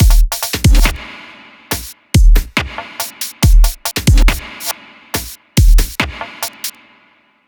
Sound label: drum loop, Dubstep; Dubstep Sample Pack